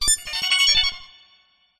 negative game sound fx arcade casino kids mobile app; epic stock media